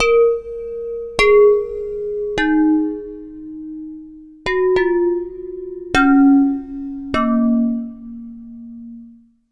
Slow Clay Dish

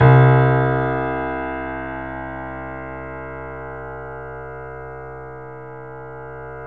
C, 3-D Audio Collection, 3D Pianos, Yamaha C7

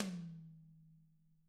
HIT, drums; ILIO; Double Platinum Drums 1

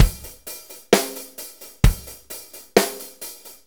4-4 Loop, Drum Loops, Full Kit Loops; Drum Tools

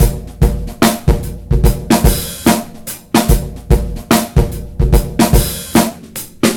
CRYBABY 73 bpm; Planet of the Breaks

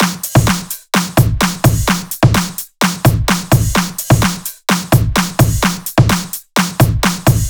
Loops 128BPM, Drumloops

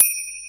JD800 Internal Drums, Roland JD800

